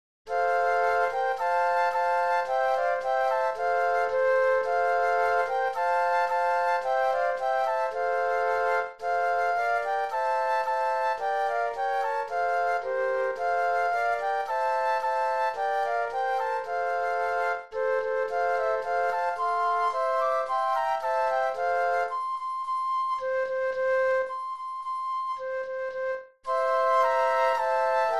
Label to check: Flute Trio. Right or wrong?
Right